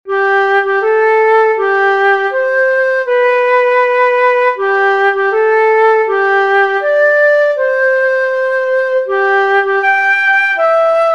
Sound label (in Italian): flauto; Spartito per flauto